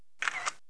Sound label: SHUTTER